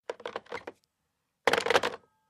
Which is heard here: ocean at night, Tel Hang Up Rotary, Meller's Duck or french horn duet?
Tel Hang Up Rotary